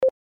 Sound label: click